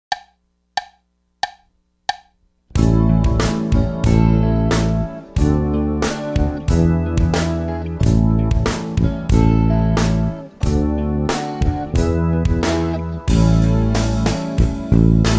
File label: doubling up, drums